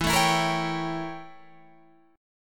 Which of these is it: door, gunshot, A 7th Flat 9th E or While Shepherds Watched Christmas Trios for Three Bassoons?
A 7th Flat 9th E